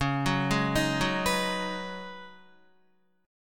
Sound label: Db 7th Suspended 2nd Suspended 4th, Guitar; Db7sus2sus4 arpeggiated